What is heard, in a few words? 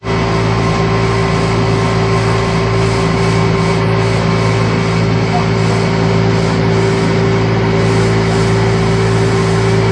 big machine; Sound FX Real Life